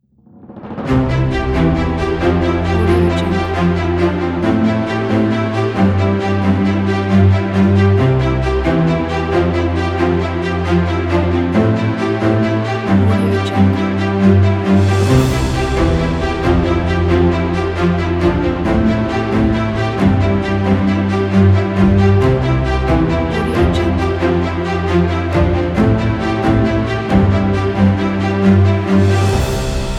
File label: positive epic orchestra